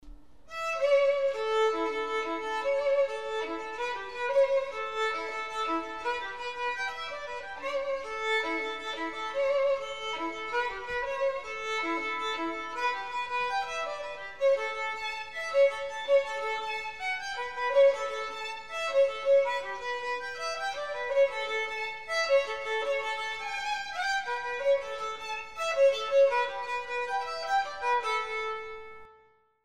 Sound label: Celtic wedding music - solo violin